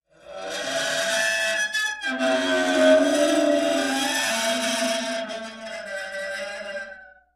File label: Dry Ice Eerie Metal